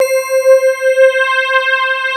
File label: EUROPA, Casio VZ1